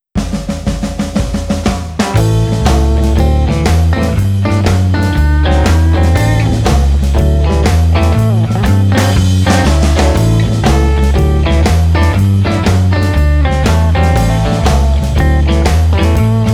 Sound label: Blues